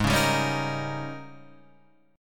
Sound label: Ab Major 7th Suspended 2nd Suspended 4th Ab, down; strummed; JGuitar